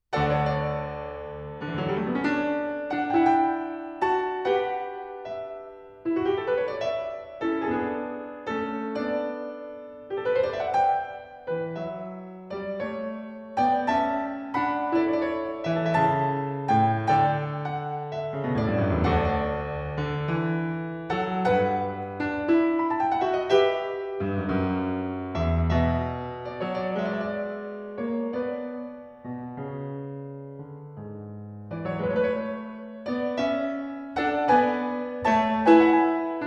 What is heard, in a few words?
Ouverture; Partita no. 4 in D major, BWV 828